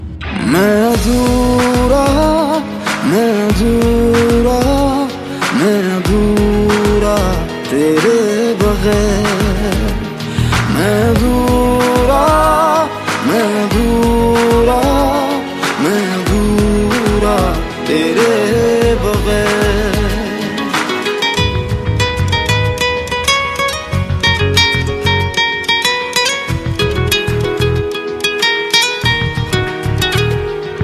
Bollywood Romantic Ringtone

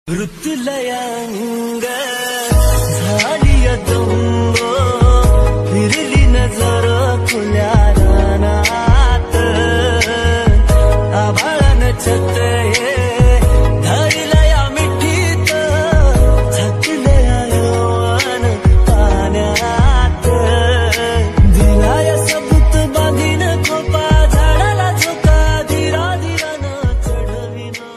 Marathi

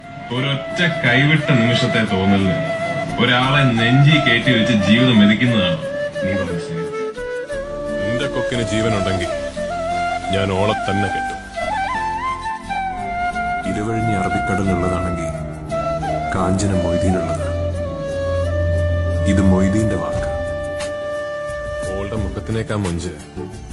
Malayalam BF Ringtone

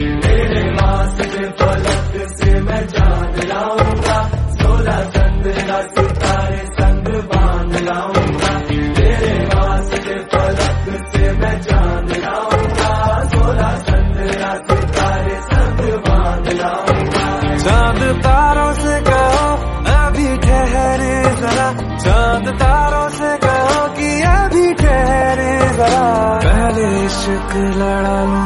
Hindi Love Song Ringtone; ringtones

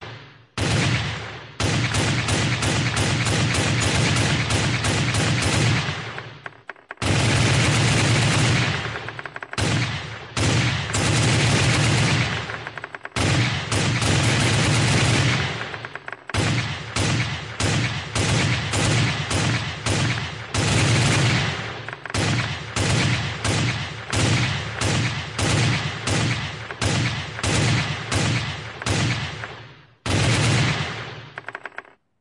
Ak47 Gun Sound Ringtone; ringtones

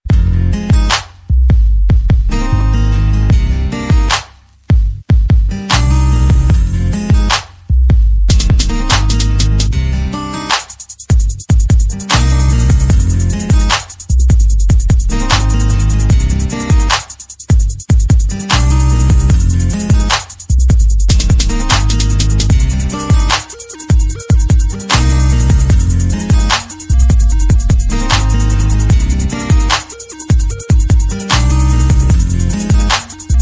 Music Ringtone for iPhone; ringtones

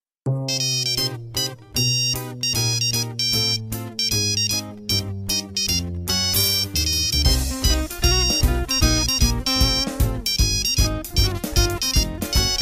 Nokia 3310 Jazz Ringtone; ringtones

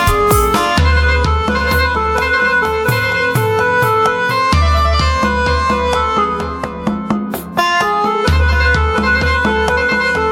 Music Ringtone

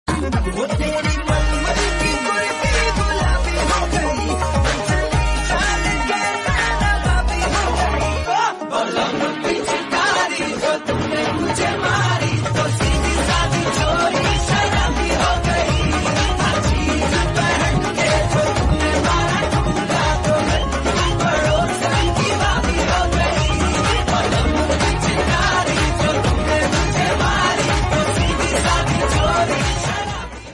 Hindi Song Ringtone

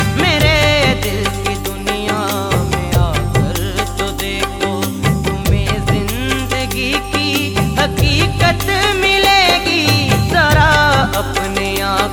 Sad Song Ringtone

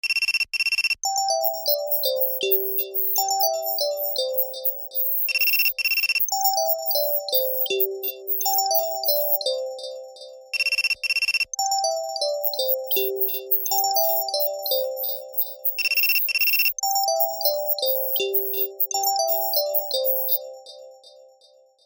Nice Alarm Clock Ringtone; ringtones

